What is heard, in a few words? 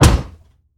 Aircrafts Engines Packard Merlin Prop; DCS